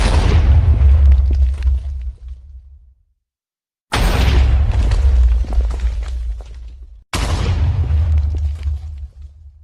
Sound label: minecraft explosions